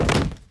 BF Rolls Comp; Fairlight Sound Lib

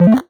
Retro FX; Cymatics; Video Game Sample Pack Vol 1

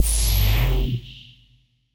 Spaceship FX; Video Game; Cymatics; Video Game Sample Pack Vol 1

